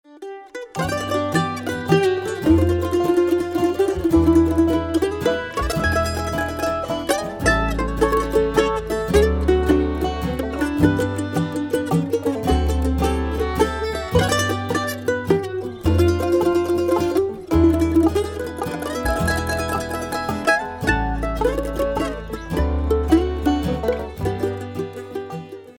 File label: Waltz